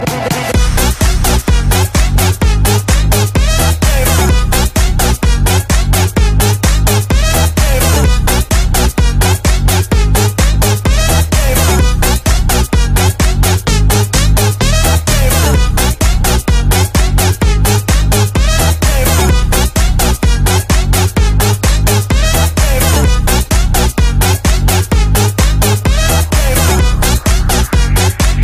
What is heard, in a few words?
Dirty Electro 146